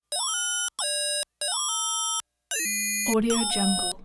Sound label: tone clusters mostly high pitch tones ringy